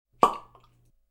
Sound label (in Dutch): Knal Van Kurk; KMP Film Video Sound Effects